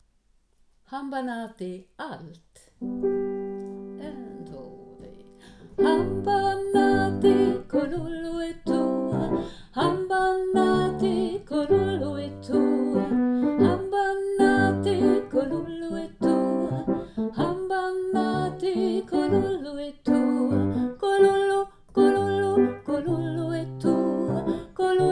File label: hamba nati alt; alla kan sjunga koren vi sjunger i höst